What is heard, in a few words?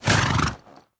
deactivate; smite; lancelot